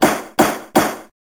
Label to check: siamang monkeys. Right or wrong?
Wrong